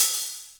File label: hi hat open, Korg ER-1; Kit 18